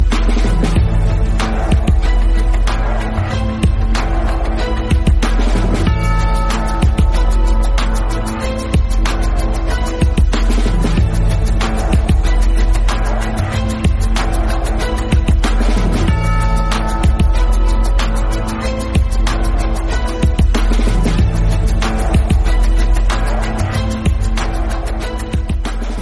yeni tuerk drama zil sesi

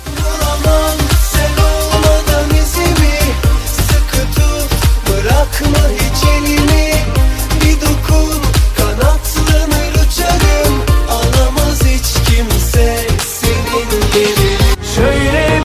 turk zil sesi

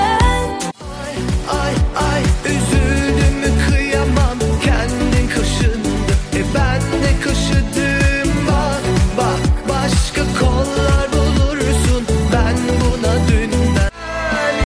turk zil sesi ringtone

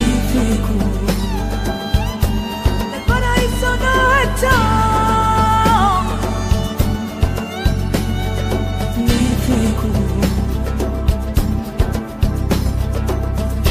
yeni tuerkish zil sesleri

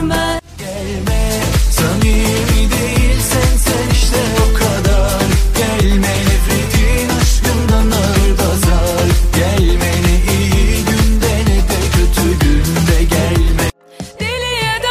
turk zil sesi

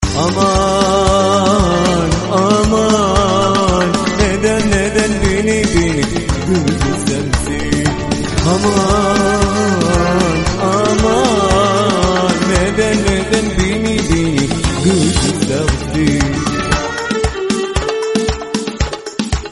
turk zil sesleri

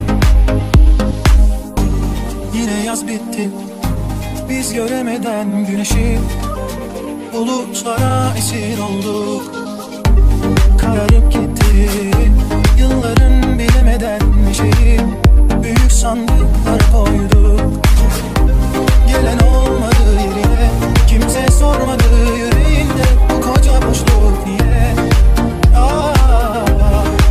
yeni tuerkish muezigi

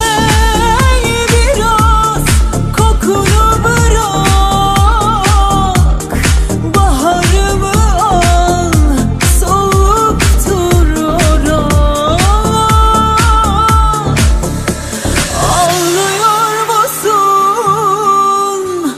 sicak melodiler ringtone

